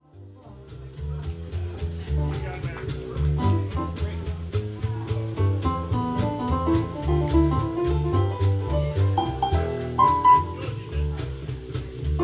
Jazz sound sample

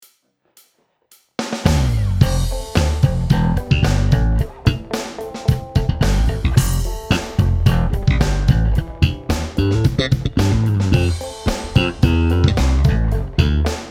slap, bass

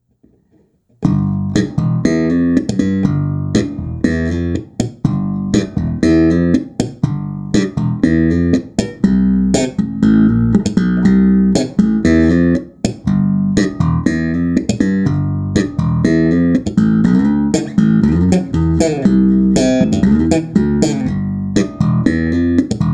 slap bass; olympus

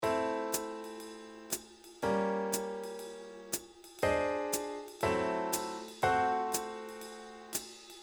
Funky Chords

